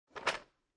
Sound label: Foley, Cardboard Board Game Box Opened, Plastic Game Pieces Rattling Inside